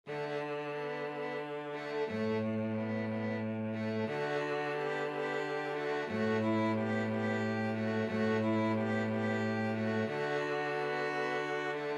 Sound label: 2 violins cello